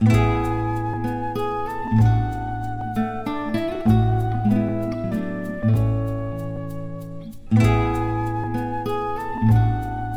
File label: BGM Restaurant; Grand Trucker Aniki Shigoto to Kenka to Koi Moyou; NDS